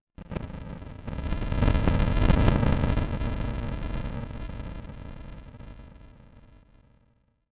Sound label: Transition Distortion Explosion; Production Element Title Transition